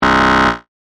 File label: Computer Buzz Error, Scifi, Computer, Buzz, Error, Low, Science, Fiction; Computers; BlastWave